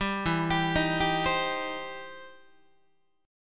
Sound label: E Minor Major 7th Sharp 5th, E, down, Guitar; EmM7#5 arpeggiated; 10,12,10,13,11,11